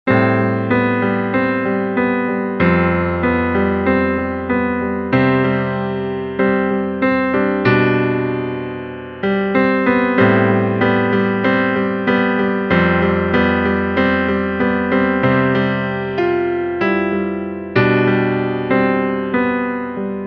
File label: iphone x ringtone